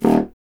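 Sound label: wind contrabassoon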